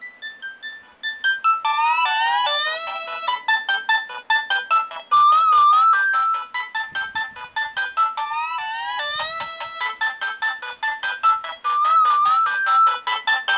nokia 1600 escape ringtone

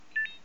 nokia 3510i hurry up ringtone

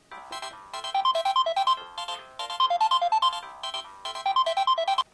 samsung sghe700 ringtone

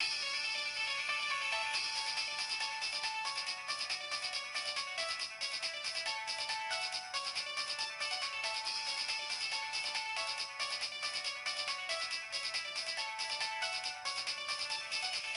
nokia1200 destiny ringtone

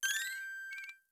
nokia 2600 ringtone; skitter

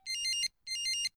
motorola startac ring style ringtone

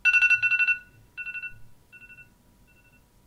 apple iphone 5 radar ringtone

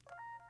motorola krzr k1 ringtones

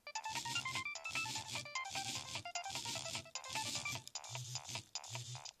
nokia 6100 ringtones; electric eel

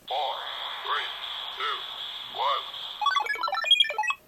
samsung sghe700 ringtone

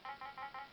motorola razr ringtones claps

